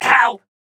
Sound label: Grunt Hit; Paladins; BombKing